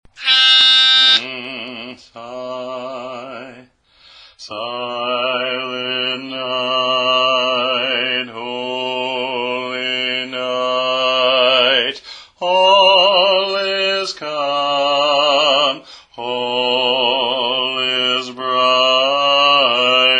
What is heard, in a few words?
Bass; Silent Night